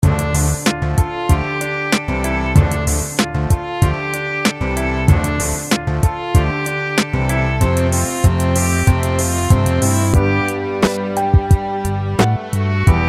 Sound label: Hip Hop Loops